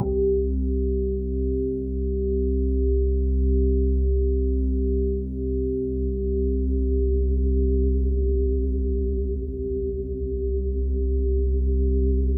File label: C3, Synth Line